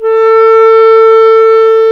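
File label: wind; Roland - LA Composer vol. 3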